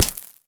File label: generic soft; Escape from Tarkov